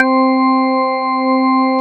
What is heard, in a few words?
C3 JAZ ORGAN